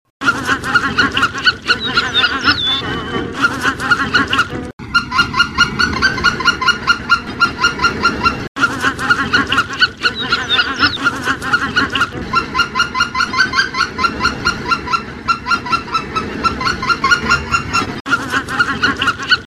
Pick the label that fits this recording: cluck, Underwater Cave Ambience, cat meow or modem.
cluck